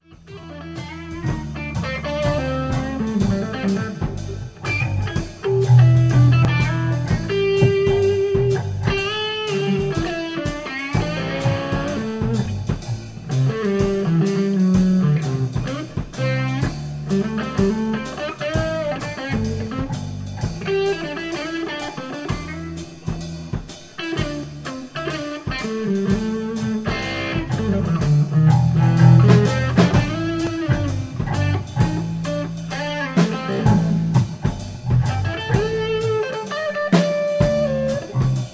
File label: Jazz Blues; ax84